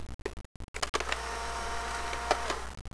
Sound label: snap